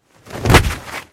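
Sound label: sounds of parachute jump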